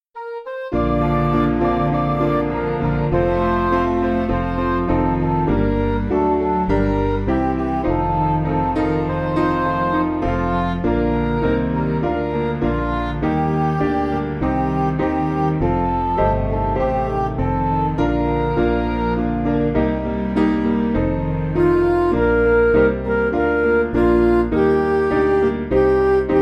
Midi; How Excellent Is Thy Name

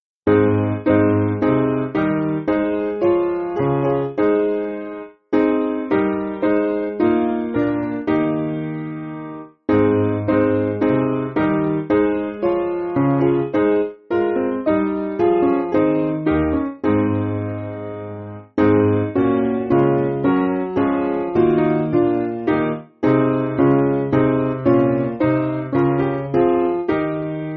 He Is The Fulness Piano; Foelallt

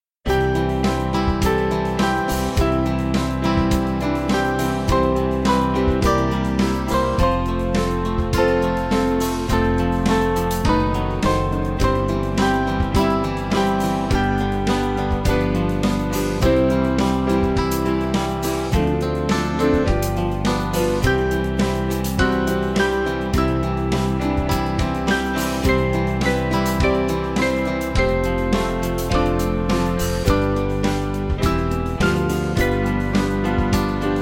My Dearest Lord, Band

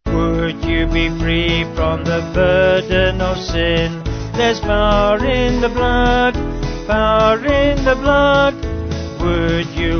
Vocals Band; Would You Be Free

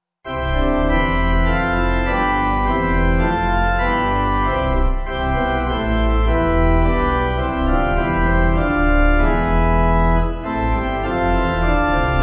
Pipe

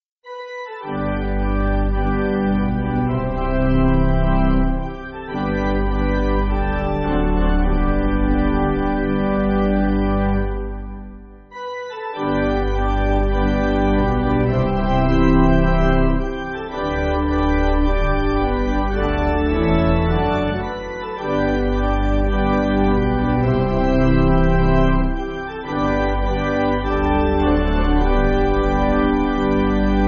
Pipe, church music

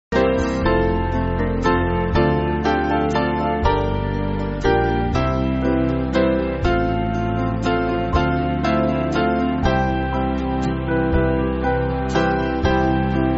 Band, church music

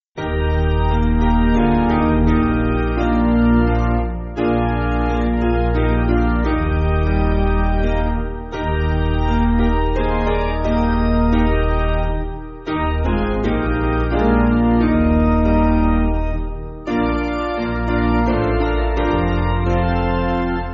If The Path German Piano; Basic Piano & Organ (CM)

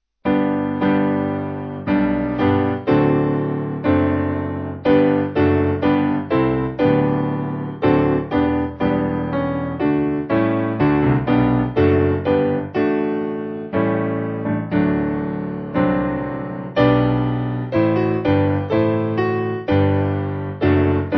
Piano; O Come All Ye Faithful

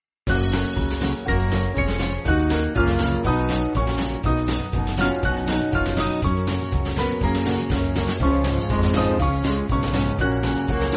Slower Band; Helmsley

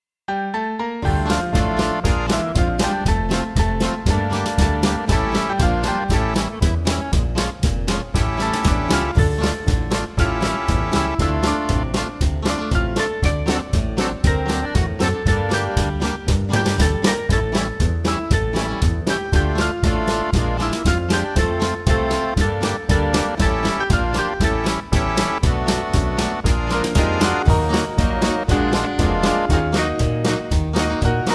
Band, Jewish, No Chorus; 4/F-Gb-G No Chorus

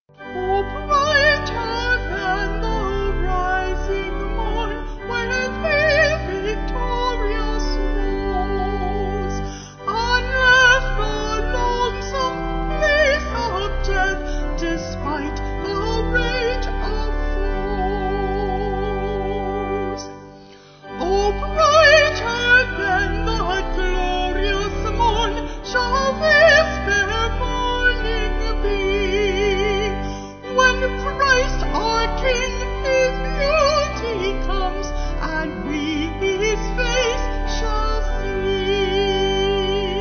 The King Shall Come St Stephen Vocal Organ

